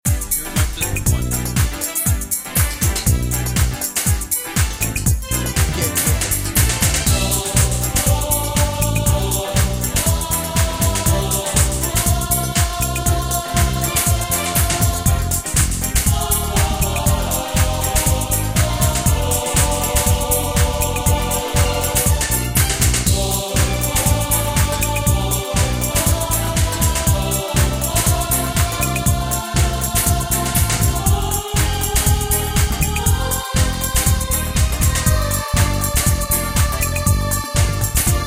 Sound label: ambiance